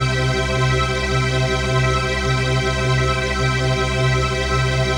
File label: PHASE PAD